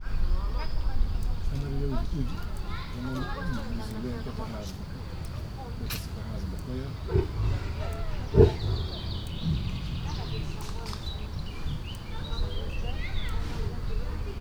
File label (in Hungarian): nagyon halkan horkant, sziberiaitigris; veszpremi zoo 2013